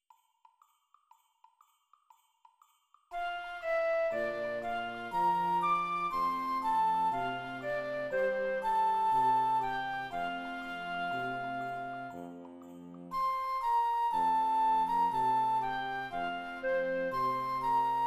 flute; Jul

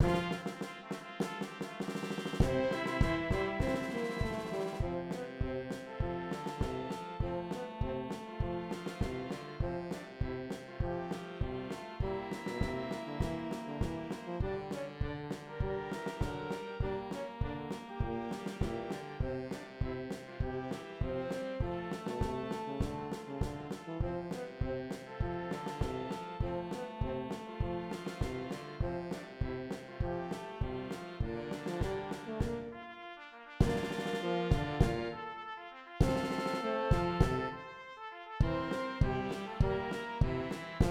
perc

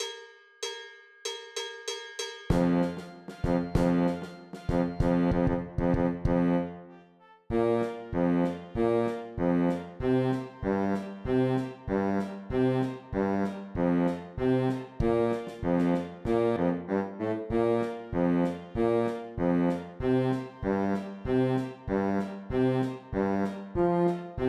tuba

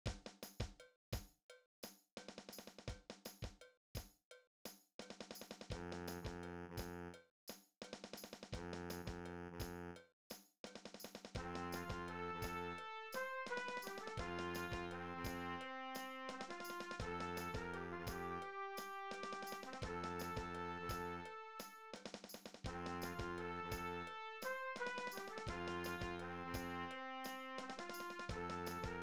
flute

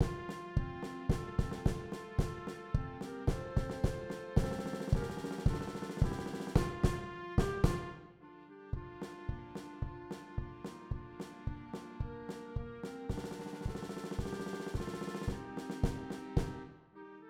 perc

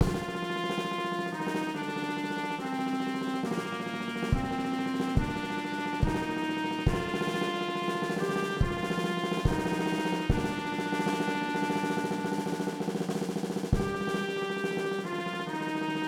perc

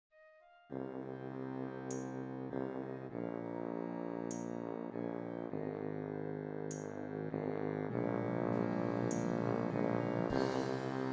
tuba

